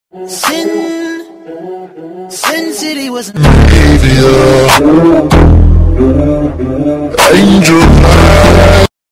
light skin stare extreme bass boosted sound effect; made with Voicemod